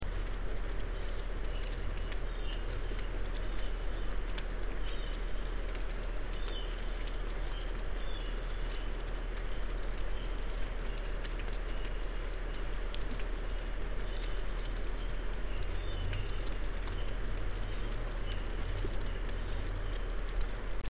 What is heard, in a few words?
otter mystery